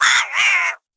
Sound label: Darkmoon Rabbit Attack